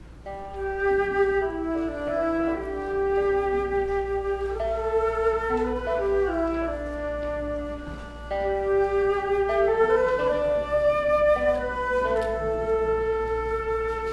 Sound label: trio folkmusic prelude